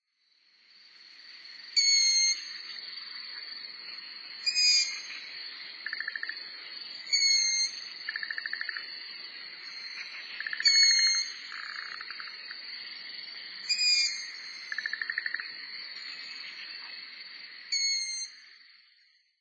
torafu, tochigi

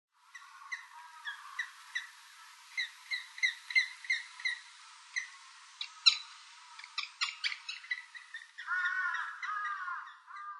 yamagera, nopporo

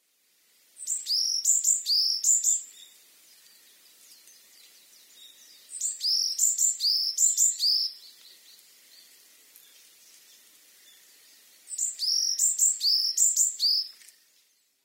amamiyamagara, amami, koe; yanagawa

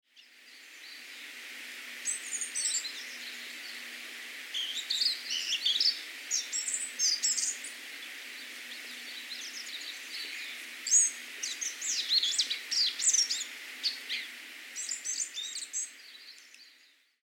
kosamebitaki; koe; hachibuse

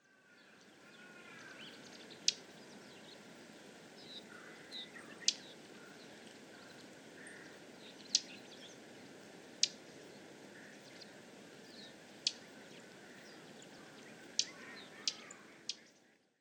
mujisekka; watarase; koe

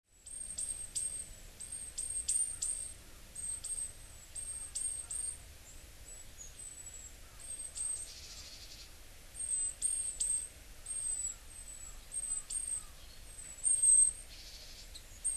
sijuukara, hyogo

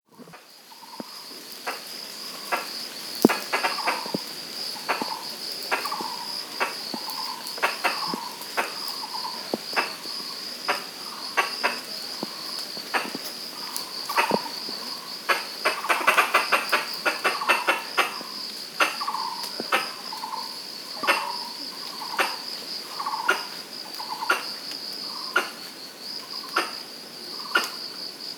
kurihararisu, taiwan heitou, nakigoe; koe

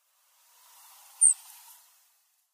wakiaka, makurazaki

